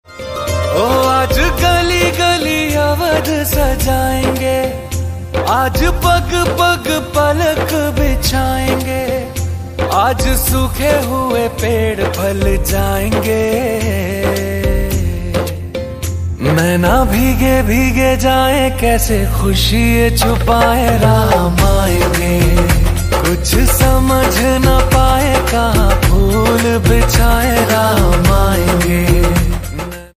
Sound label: ringtone ringtone